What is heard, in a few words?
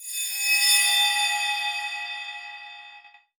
Title Transition Crystal Glow; Adobe; Production Element